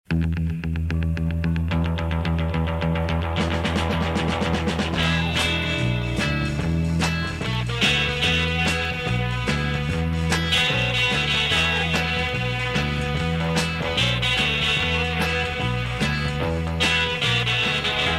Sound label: solo; argentinean